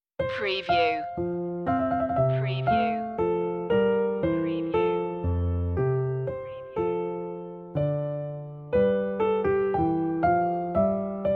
bach air f major; notebooks annamagdalena bach; bwv anh131

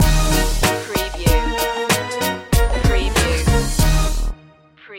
timba love

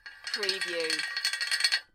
Metal Chain Pulley - Rattling Chains; Industrial / Machinery

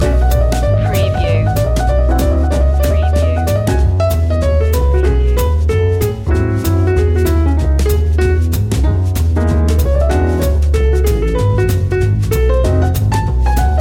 tip tap loop

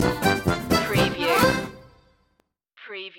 cajun stinger

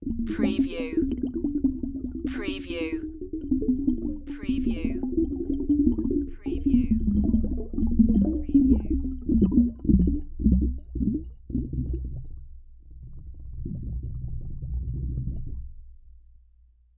Underwater Bubbles

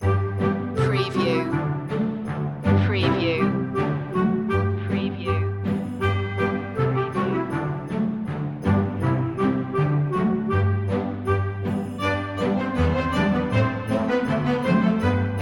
ding dong merrily on high 1 verse

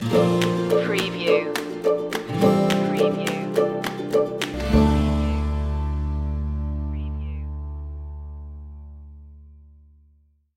clean path stinger

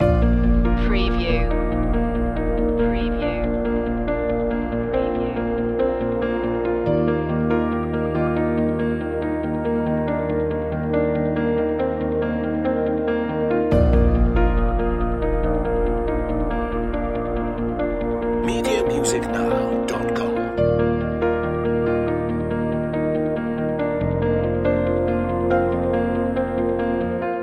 touch the sky loop

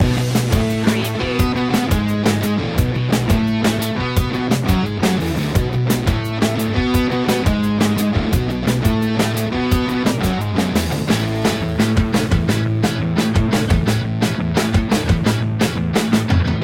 punk pop loop

